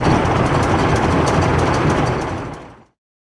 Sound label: Removable Dozer; BTD6